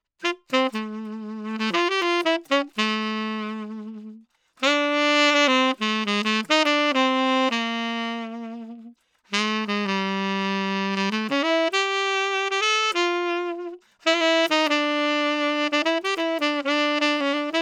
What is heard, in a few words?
Saxophone